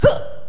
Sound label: jump; alienarena; femforcer